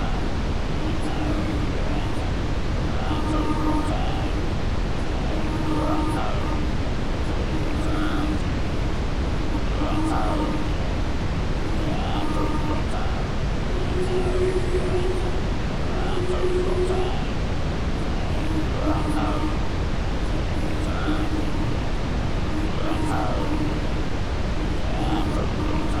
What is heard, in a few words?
mirror music